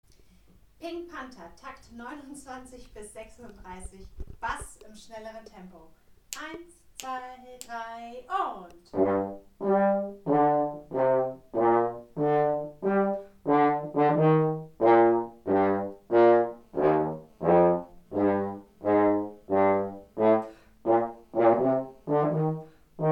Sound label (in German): Stimme 4 schnelleres Tempo, Posaunenchor; Takt 29 bis 36